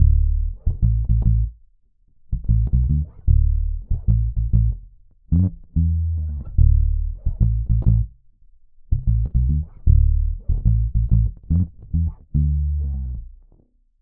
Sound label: Ibanez EHB1006MS Finger Neck Low Boost Active